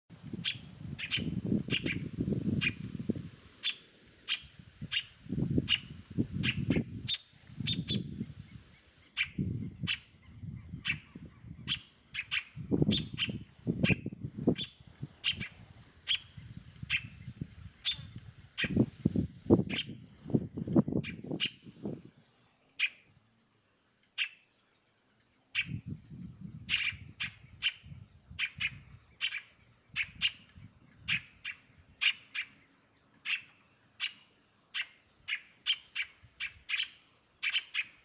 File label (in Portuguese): canto do tie cabure